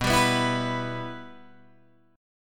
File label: B Suspended 4th Sharp 5th B Guitar Open G